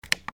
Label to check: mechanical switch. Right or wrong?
Right